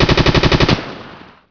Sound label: light infantry; Emperor - Battle for Dune